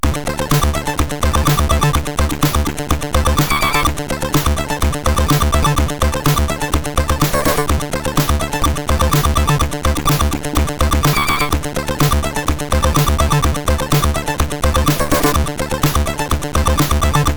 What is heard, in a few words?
Hires Demo Kit, CSG8580R5